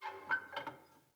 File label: Tent Fire Pot Metal Creak; Soul Plane